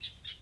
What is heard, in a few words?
Amb Hong-Kong Bird